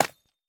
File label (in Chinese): 方解石破坏声